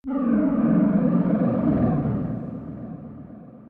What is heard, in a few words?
ambient port default; World Of Warships